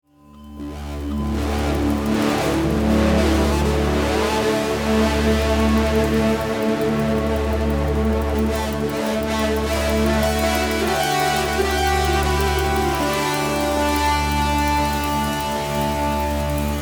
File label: Fanfare